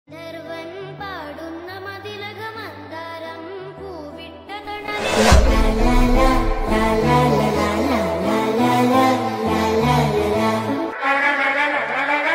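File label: villain background music; forensic; mixed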